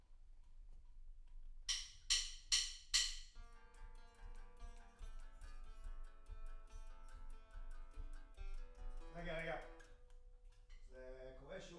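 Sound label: Guitar Mic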